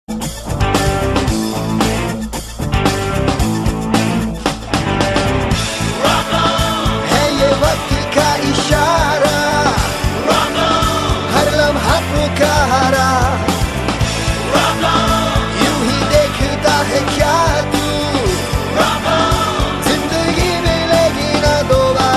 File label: 3D Rock On